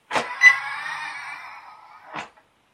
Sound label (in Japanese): toilefuta